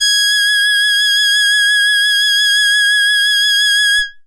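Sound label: Box Cello DX100 G sharp 6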